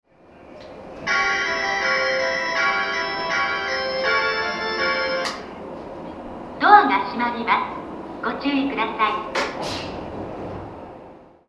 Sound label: melody, station